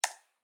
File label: Computer Keyboard Single; SoundsCrate